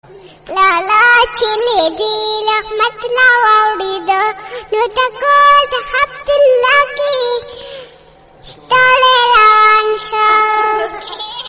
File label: pashto naat ringtone